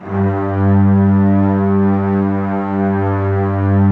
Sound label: LOW VLNS G2; Q Up Arts - Denny Jaeger Master Violin Library